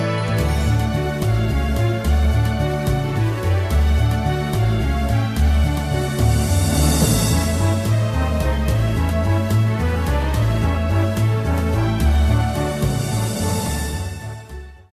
Underwater BGM; SSBM; Rainbow Cruise

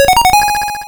Red Coin; SMBDX

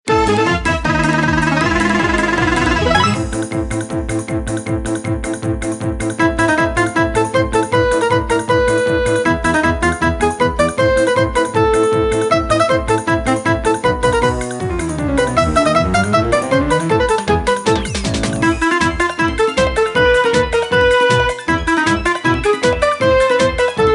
Bustling Noisily

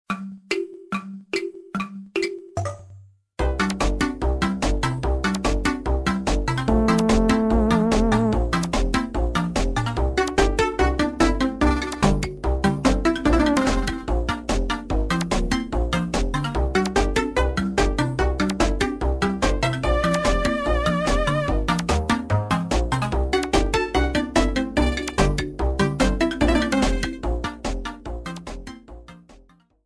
Music Tick Tock Clock

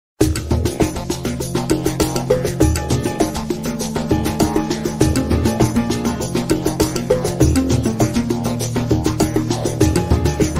Underground; SMM; SMB3

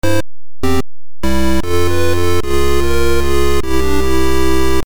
Game Over; SMBS

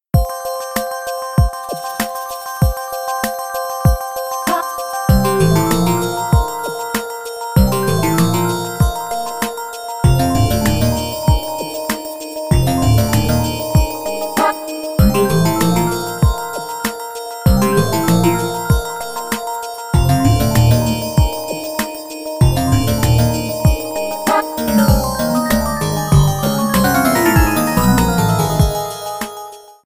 Underground Gold Rush; NSMB2

